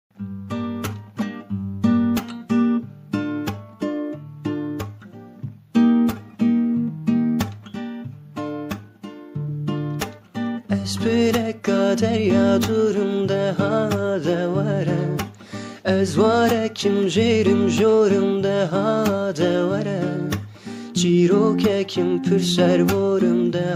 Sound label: Guitar